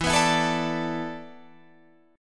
E 7th Suspended 4th, Guitar, Drop D

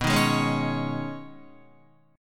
B 6th Add 9th B Guitar, chord; B6add9; strummed

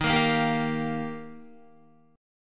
Eb Major Add 9th, Guitar; Ebadd9 strummed; 10,13,10,12,10,13; JGuitar

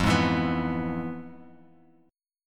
F Minor Major 7th Flat 5th Guitar Open F; FmM7b5 strummed; JGuitar

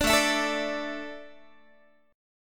A Suspended 4th, Guitar; Asus4; strummed

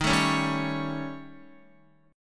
Eb 7th Flat 5th, Eb, Guitar; x,6,9,6,7,9; Eb7b5 strummed; JGuitar

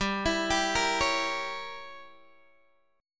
G Diminished 7th G down Guitar Open D

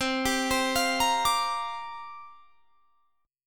C 7th Suspended 2nd Suspended 4th C down; C7sus2sus4 arpeggiated

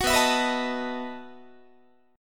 B 11th Guitar; strummed; 21,19,19,21,19,20

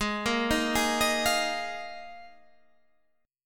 D sharp Suspended 2nd Suspended 4th G sharp

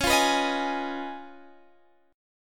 Db 9th Db down Guitar Half Step Down

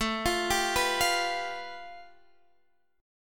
A 7th Suspended 2nd Sharp 5th, Guitar, Open G; x,14,15,12,12,15

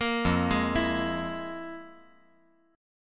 F Minor Major 11th, F

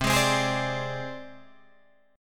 C Suspended 2nd Sharp 5th C down Guitar Drop D

